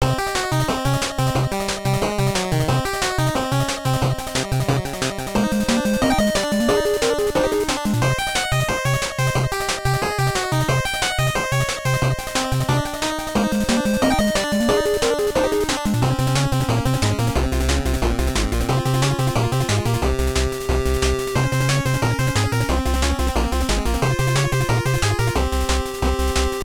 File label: 8Bit Battle Loop